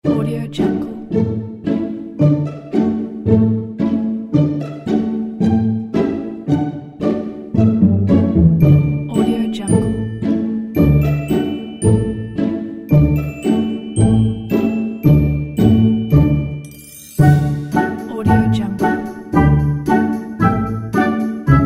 childrens pizzicato

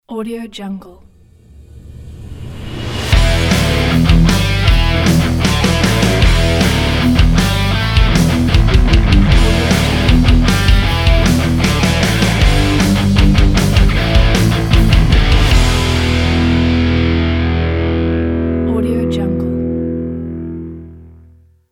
hard rock logo

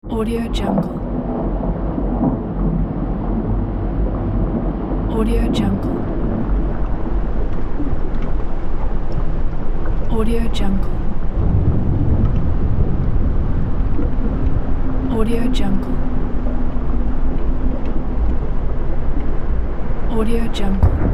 volcano eruption and lava flow

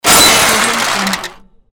crash

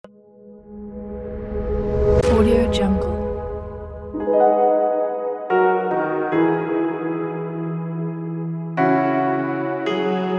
groovy beat kit

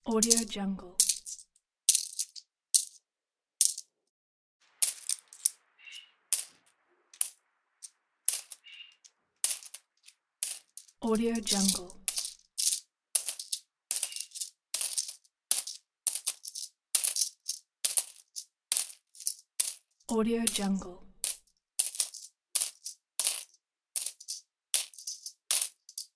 counting coins

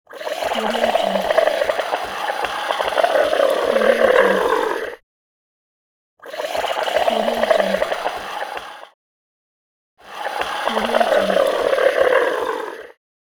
froth milk

